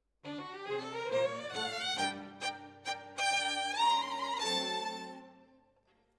Violin Solo

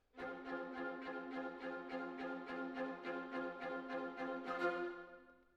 Cello Solo

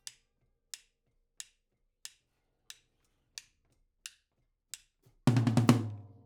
OHs

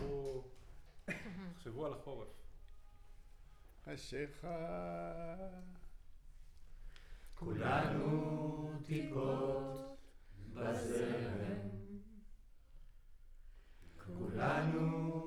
Center u47, Choir

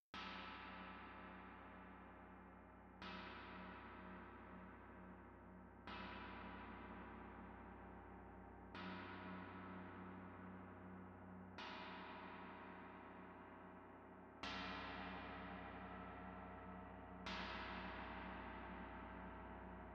Tam-Tam Rear

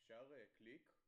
Kit, 94bpm

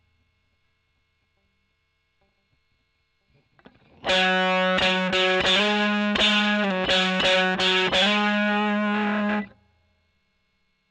E. GTR Gefell OD

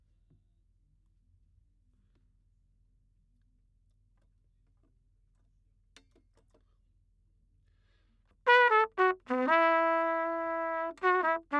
Trumpet

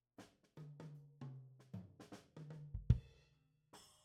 Kick out, Ragtime

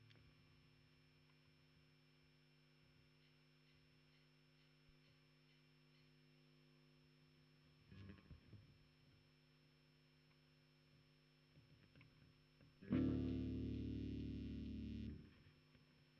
Bass DI

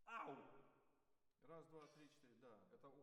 ORTF SCHOEPS, piano

